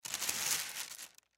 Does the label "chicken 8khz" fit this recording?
No